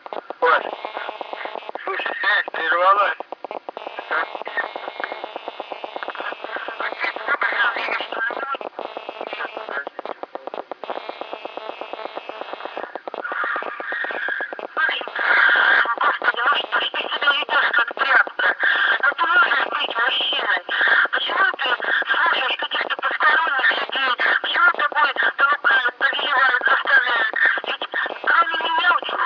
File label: gsm noise